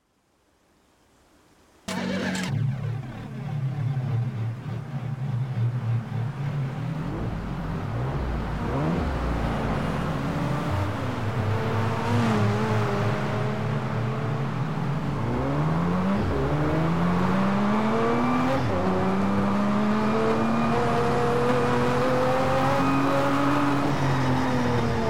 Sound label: ferrari enzo fxx; TDU